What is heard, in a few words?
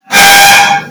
pushing chair